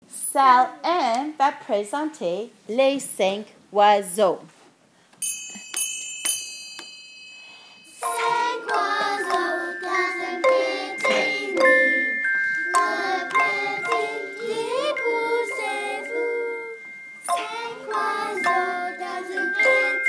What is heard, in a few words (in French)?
Oiseaux